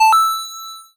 coin, A retro coin sound effect; Gold Coin

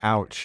pain

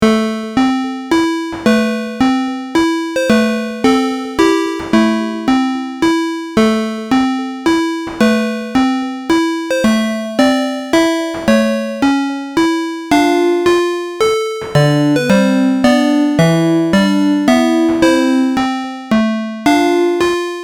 Waltz

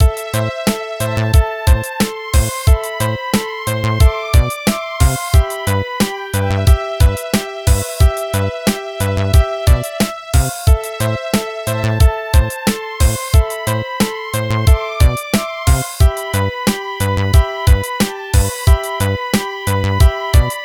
Midi; Extreme Love

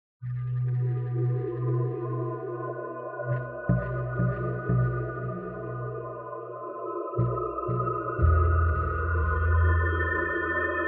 a cold wind, Ideal for ghosts, spirits, etc.

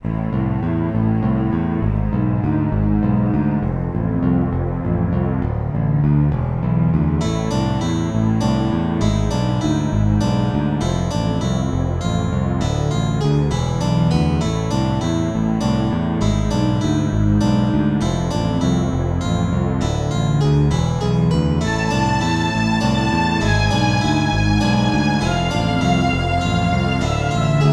death waltz

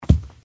jump land

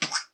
squish

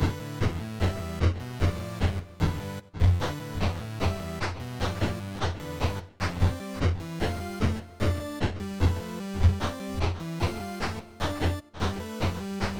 funky loop, simple fakebit loop; artartart

